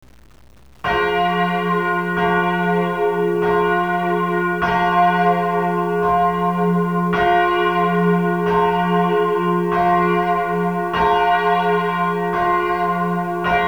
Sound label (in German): Maertyrer Glocke, glockenklang; Jesuitenkirche Innsbruck